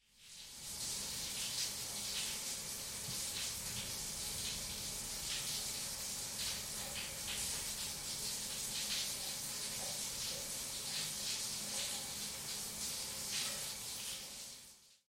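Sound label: noise of water from a closed shower cabin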